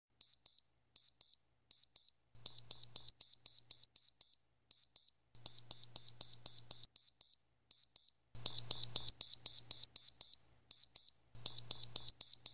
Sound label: Super Beep Beat